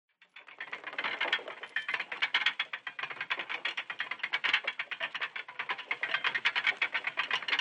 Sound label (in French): mouvement de chaine